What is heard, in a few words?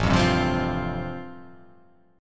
A-Minor 7th Sharp 5th, chord; x,0,3,0,0,0,2,2; Am7#5 strummed; JGuitar